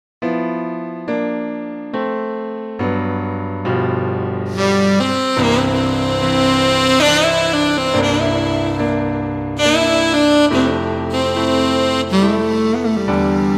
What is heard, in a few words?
Jazz Sax